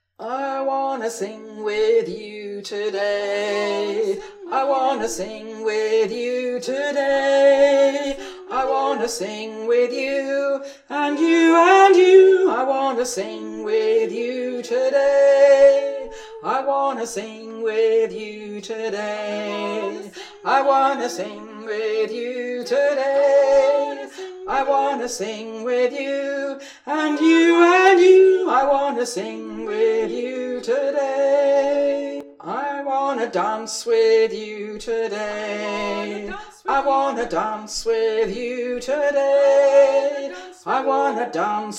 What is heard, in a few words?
low harmony